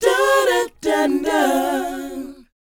DOWOP G#4; ILIO; Vocal Planet

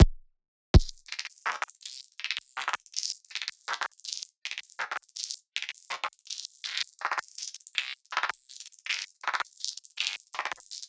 textures

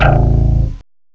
BASS SLAP; M8; CMI Factory

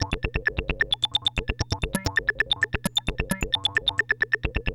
TEK NOISE, SYNTH SEQ

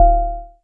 DULL, PERC HITS; Zero-G Ambient 1

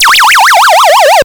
Apple II FX Melting, Chiptune

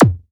Zap, Waldorf Blofeld

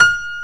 PIA SYN; Best Service Gigapack 1

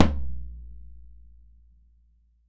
BOMBO FF, Brazil Drum Perc; E-MU Producer Series Vol. 7 – Old World Instruments

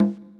Conga, Yamaha PTX8

